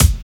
ALERT KICK; Drums In Your FACE!; BONUSKIK

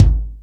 Lotsa Kicks, Drum Machines E-MU Pro-Cussion

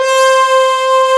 FRENCH C 4, ORCH; AKAI S-Series CD-ROM Sound Library VOL-2

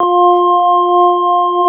CX3 ORG F4, Keyboards Old School; USB Soundscan vol.09 - Keyboards Old School; AKAI

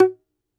DOMRA, Segundo Domra, Plucked Strings

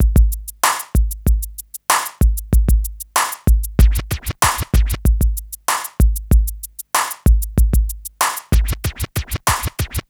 Smooth, R&B Drums

